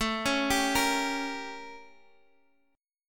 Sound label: A 7th Flat 9th A; A7b9 arpeggiated; 17,16,17,15,x,x